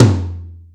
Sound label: AMBIENT TOM, drums; Big Fish Audio; Synth City